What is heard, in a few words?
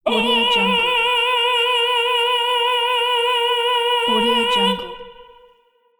opera male voice